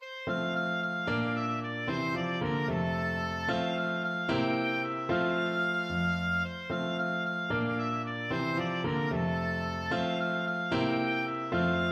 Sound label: vive la compagnie, oboe